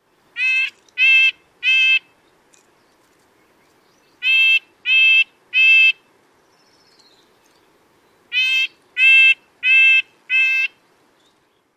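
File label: toots, Red-breasted Nuthatch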